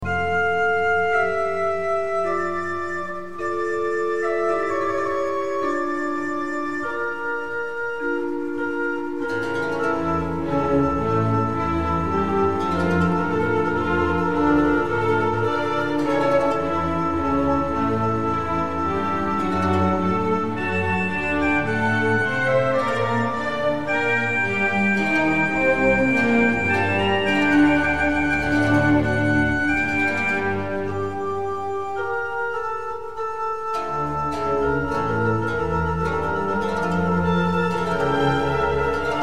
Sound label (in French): concerto pour orgue largo e piano; HAENDEL